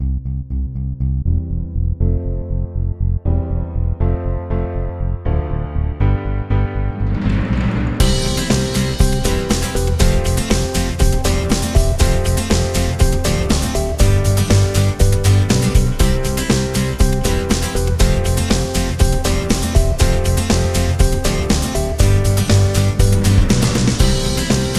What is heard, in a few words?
Inspiring; Melotune